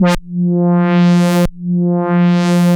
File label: Roland A F3, synth samples; Roland S100; musicradar-synth-samples